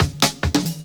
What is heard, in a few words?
Loops Fills; Vengeance Samples